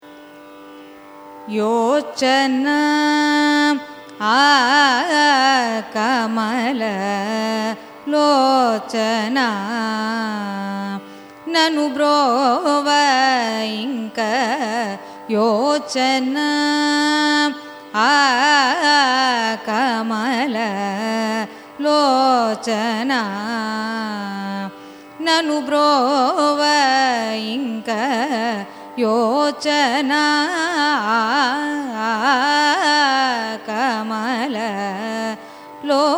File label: raga darbar carnatic; yochana